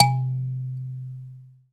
KALIMBA; Spectrasonics; Heart of Africa; KALIMBAS

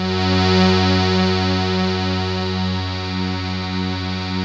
NOIZ SYN; Masterbits; Vintage Voltage; SEQ-FX

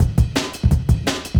170, Breaks; EZRollers

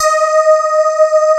STRIA, Twisted Textures; STRYNGI

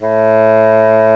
BASSOON, REEDS; CMI Sound Library Rev 1.3

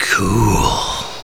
COOL, Brass; Roland L-CD702

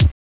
Kick, Genesis; Zool

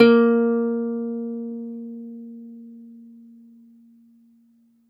laute big; SoundBytes; Early Patches

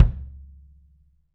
KIDWM, 3D Drums, DWMA KICK

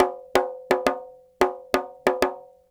Petia, World Percussion

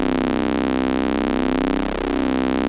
OB C2, Rare Synthesizer; Hollywood Edge - Giorgio Moroder Rare Synthesizer Collection; OB 4 VOX.

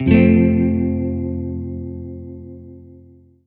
Synths Root C

